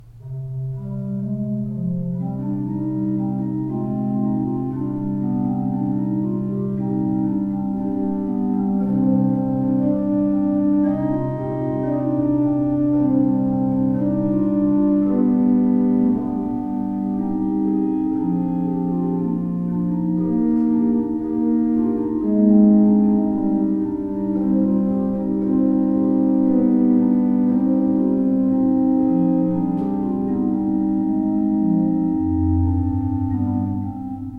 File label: pipe organs; Breil 19 II P